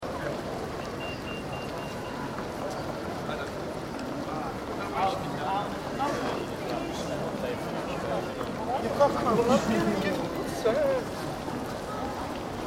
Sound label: Pedestrian ambience in Liverpool city centre shopping precinct.; Cities; City Center Pedestrians